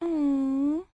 devushka rasstroilas

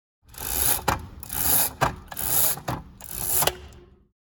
kuhonn jaliuzi

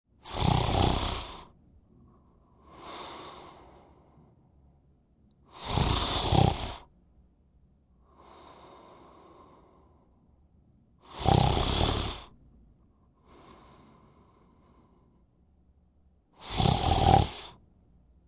hrap

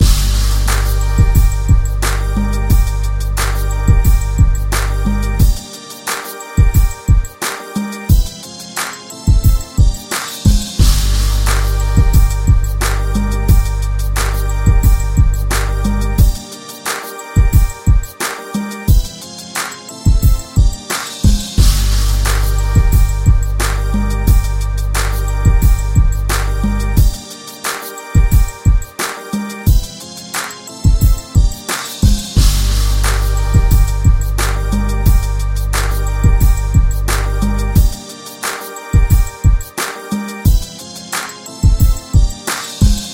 muzyka dlya igr

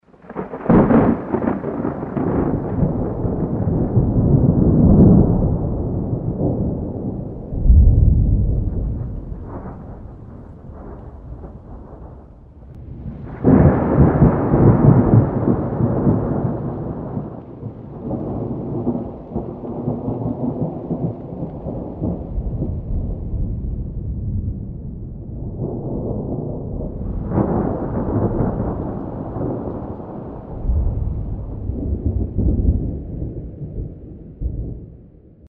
grom

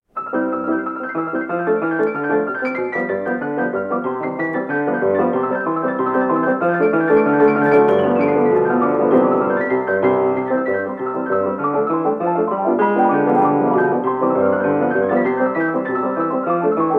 komediinaya muzyka nemogo kino

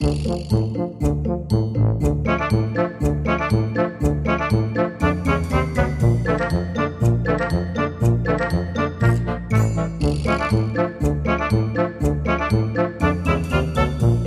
muzyka na helouvin detskaya

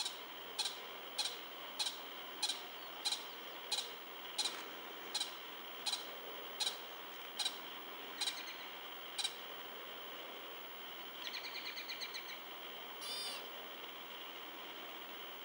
pishuha, zvuk pishuhi mlekopitayushie

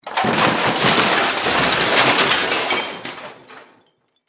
dom obrushylsya

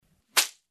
udar pletki

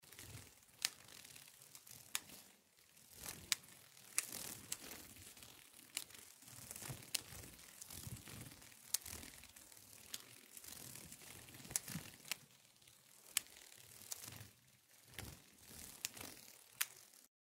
zvuk motilka

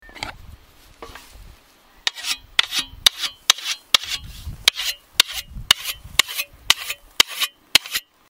tochilo

